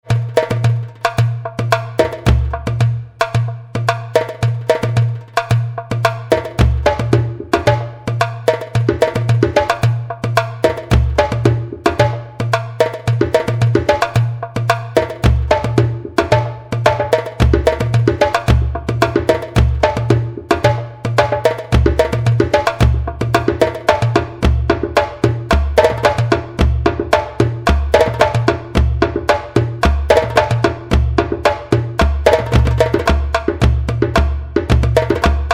Djembe and Doumbek

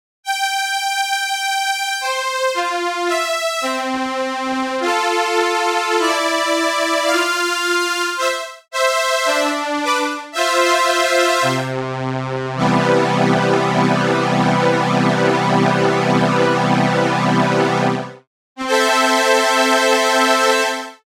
solina, synthesizers

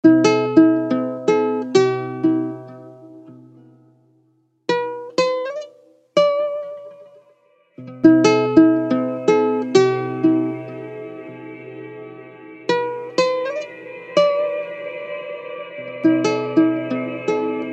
dry to wet slow; sofir demo

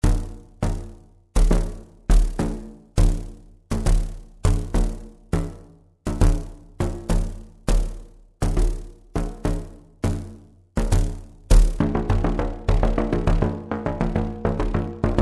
drumit membrane

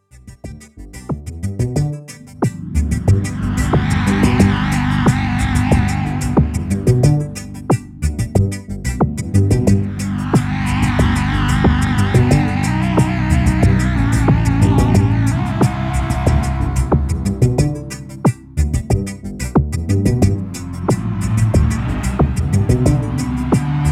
Hindu Samba

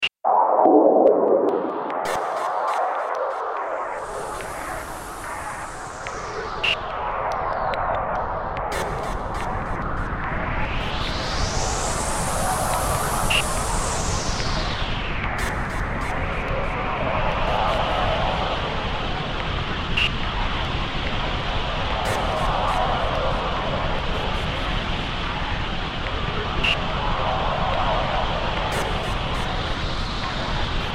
Noise Beat 144

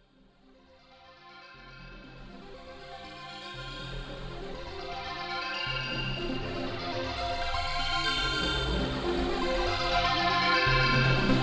modulations, synthesizers

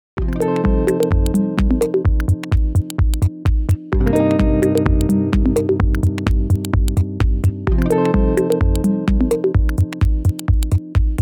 dry wet drums guitar

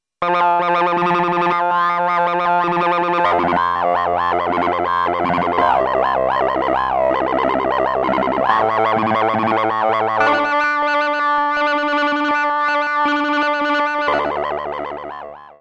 formantik voweling, synthesizers

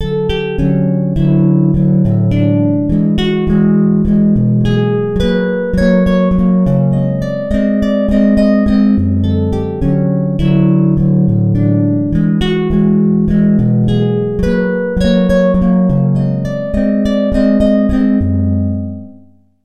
guitar dry loop; modelonia

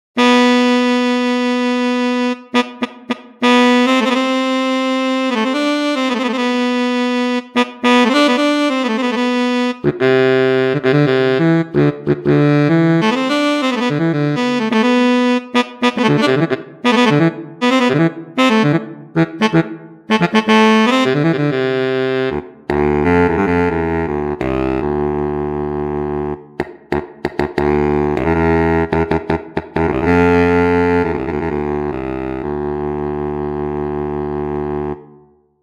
Bass Sax Soloing

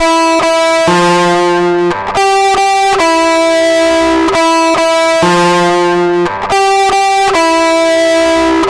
Gentle Riff Fuzzy Box

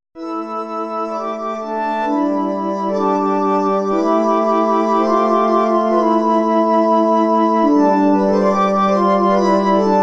fanfares; melodonia

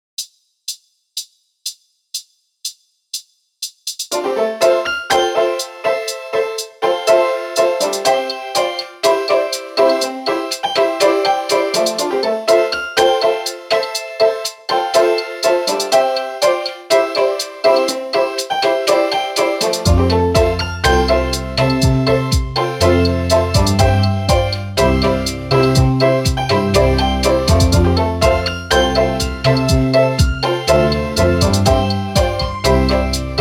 room piano softer

